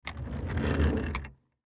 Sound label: Chair Roll Wood Short; Office; Blastwave